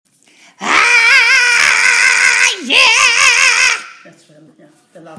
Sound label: rattle